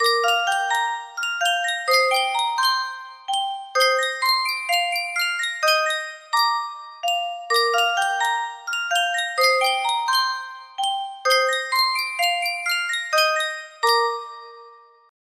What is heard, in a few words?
Sankyo music box, music box melody